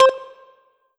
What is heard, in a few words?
Roland SR-JV80 Dink Melodie C5